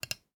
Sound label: Button Click